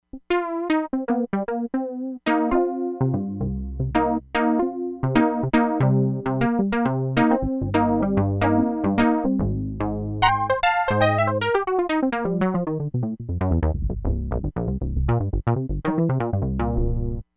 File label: FUNK TOUCH, Crumar Bit 99; Factory Programs